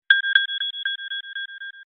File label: findme alarm, notification